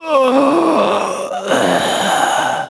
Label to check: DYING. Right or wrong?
Right